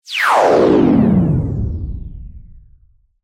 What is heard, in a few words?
Laser Wipe Descend; Production, Element, Laser, Warble; Lasers (Sound Effects:Science Fiction); BlastWave